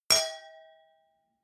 wine glass different clink bounce dull hard, gastronomie; berufe; soundpool